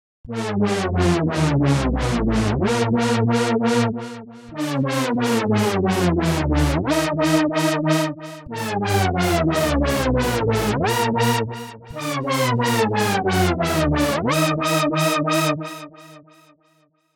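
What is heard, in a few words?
sequence haumea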